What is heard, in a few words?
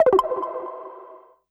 Notifications and Buttons